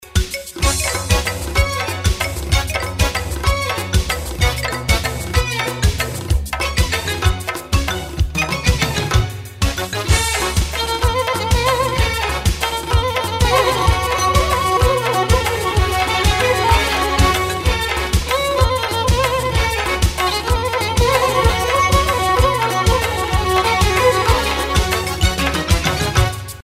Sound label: rington mobile; زنگ موبایل ساده و شیک